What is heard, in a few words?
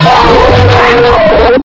jumpscare